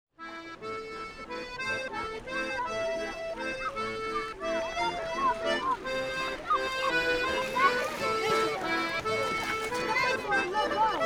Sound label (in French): Souvenir de baignade, Ambiances Sonores; Ardeche; Studio Les Trois Becs